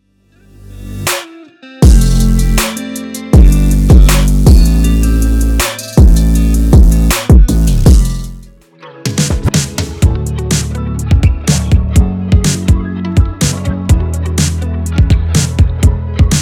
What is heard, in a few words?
Hyperpop Drumkit